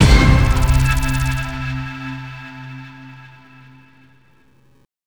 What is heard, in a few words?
Victory; epic war td 2